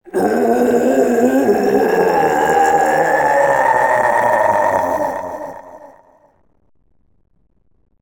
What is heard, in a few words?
Guardian Roar (Japanese); Sonic Riders